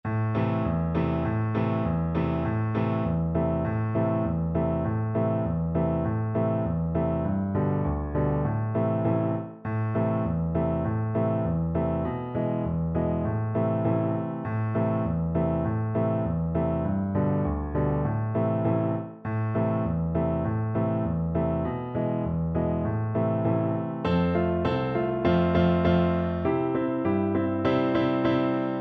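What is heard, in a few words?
recorder karaoke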